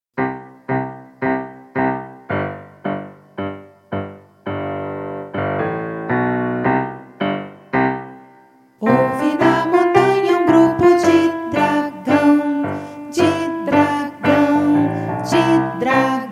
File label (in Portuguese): playback cancao do dragao